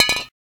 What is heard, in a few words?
grenade bounce; ufoai; weapons